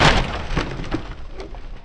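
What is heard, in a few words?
wood large; trickstyle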